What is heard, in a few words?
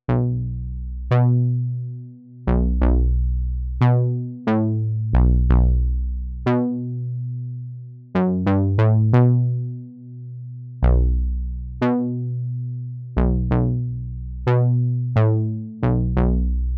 90 Bass